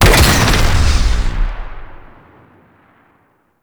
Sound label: Ram Sledge Fire; Ship Power; Warframe; RailJack